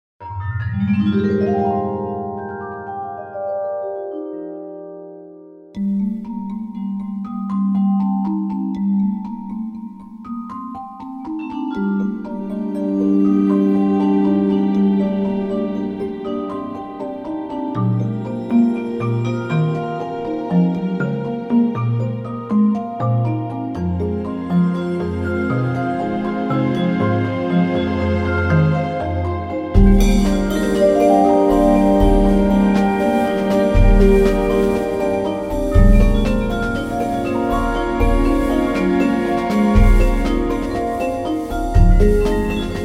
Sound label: finemallets